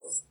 Menu Fx

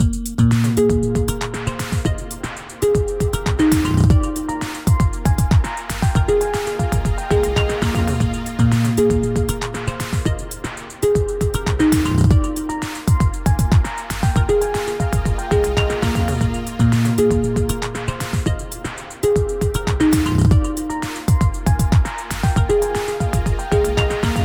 Infographic News Loop

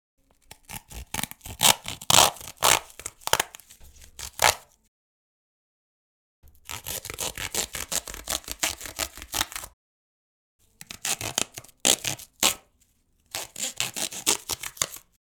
Cardboard Cutting

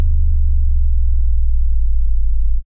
Sub Rush

